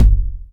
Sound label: 909 LO TOM, TR909